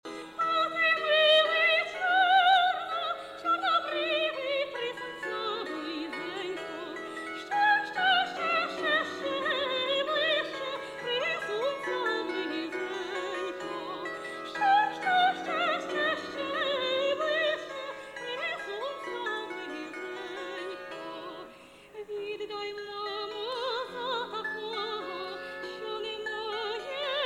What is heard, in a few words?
symphonic choir and orchestra; kiev; ukraine